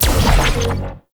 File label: Vortex Cast; Warframe; Trapper